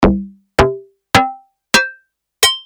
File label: dx21 heifer bell; commodore amiga